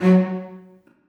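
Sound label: celli staccato f#3, Symphonic Orchestra; Sonatina Symphonic Orchestra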